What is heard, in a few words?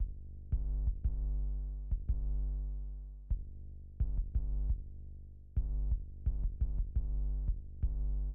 music interactive; World of Tanks